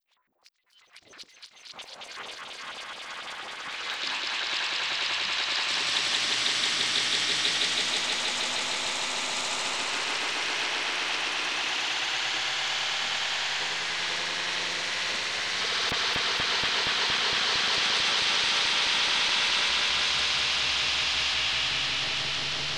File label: Glitch